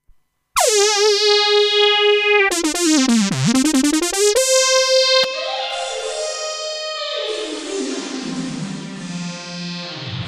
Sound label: Solo Drum Harp, PCM60